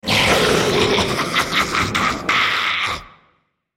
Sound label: Dragon laugh; Shyvana